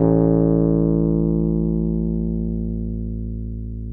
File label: Wurlitz EP; Sonic Reality - Vintage Timetraveler